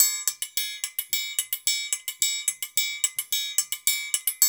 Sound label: Hip-Hop Gong; Spectrasonics - Supreme Beats - World Dance